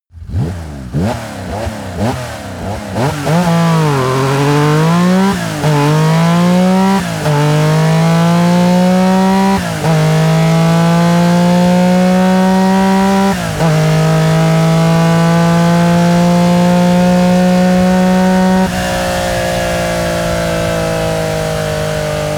car porsche 959 revving fast acceleration deceleration